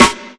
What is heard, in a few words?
Claps & Snares